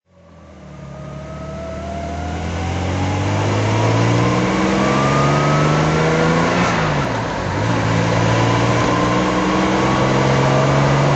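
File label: jaguar xk140 drive, engine sound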